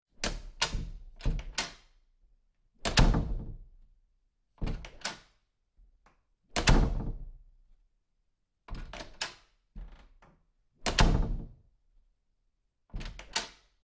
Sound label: Door Open and Close